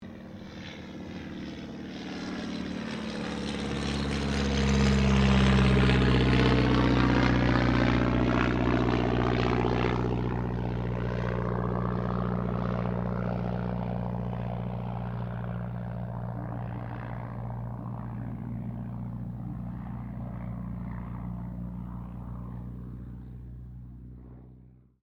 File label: AIRCRAFT DH82A DEHAVILAND TIGERMOTH PASS; 1936 Tigermoth; Airplane Sound Effects; Vehicles